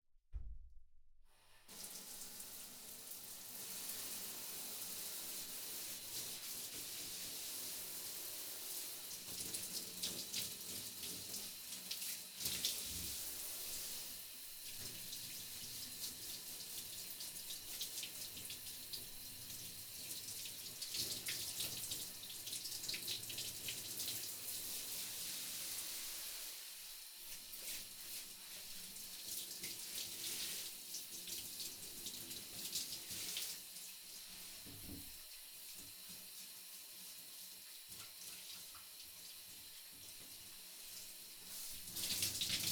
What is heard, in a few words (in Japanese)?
シャワーで体を流す; 3DIO; お風呂に関する音